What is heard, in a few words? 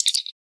Communicator